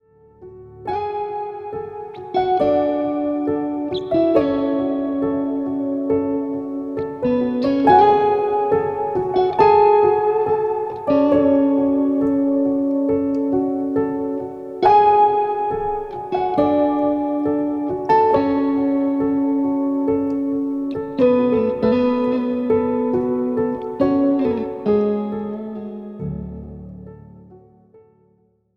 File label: Instrumental Biolateral